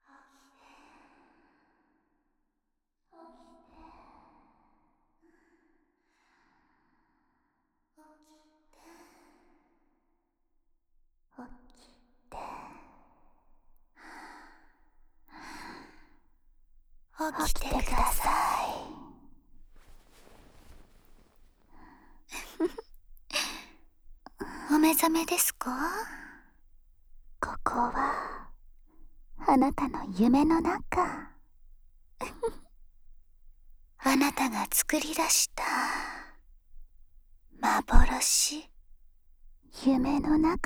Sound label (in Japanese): 両耳奥舐めえっち; 二人になったお姉さん♪夢オチ; 一人で両耳責めしてくるプロ級耳"奥"舐めマスターなお姉さんにお耳の奥の奥までほじほじグポグポされる話